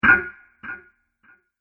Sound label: Metal Clunk Sound With Echo; Miscellaneous Impact Sound Effects; Andrew Fleming